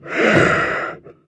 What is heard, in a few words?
alert player, antlion guard near; hl2